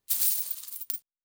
coin hand grab; soundscrate

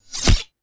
Knife Stabbing; soundscrate

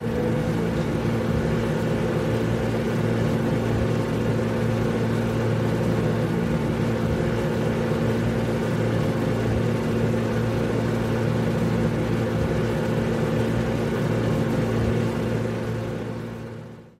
robotic machine long

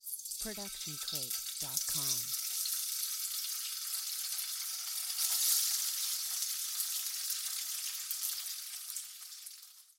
Sizzling; SoundsCrate

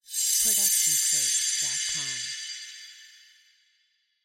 magical sheen; soundscrate

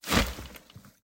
Debris Shuffle; SoundsCrate

